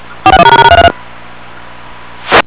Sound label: dtmf 40 20; whelen